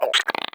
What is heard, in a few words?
vox idle, creatures, snark